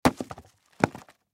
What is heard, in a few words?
Book Large Drop, Book Drop Binding Cement; Books; Offices; Blastwave; Foley